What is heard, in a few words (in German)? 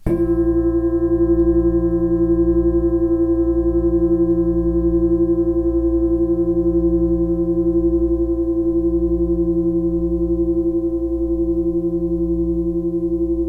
fussklangschale